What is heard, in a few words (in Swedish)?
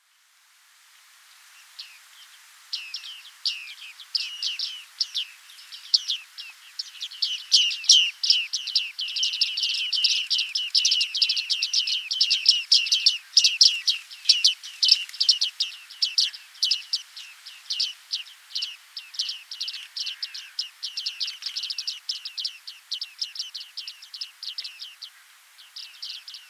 skaggmes